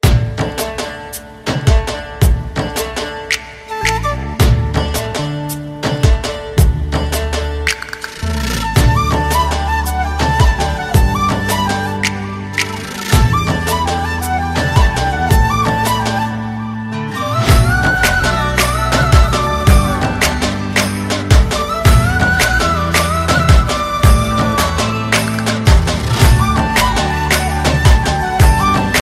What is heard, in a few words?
instrumental ringtone, bollywood